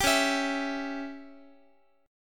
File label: C sharp Diminished C sharp down Guitar 4A 4E 5A 5D 5G sharp 6C sharp; C#dim strummed; JGuitar